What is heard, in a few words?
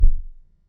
Deep Pop